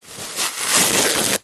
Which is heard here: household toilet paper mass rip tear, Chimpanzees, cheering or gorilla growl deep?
household toilet paper mass rip tear